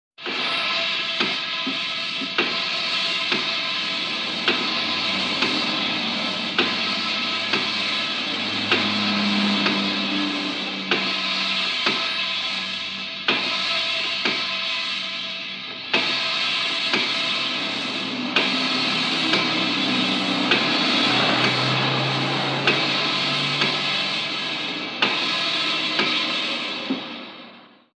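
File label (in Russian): гидравлический насос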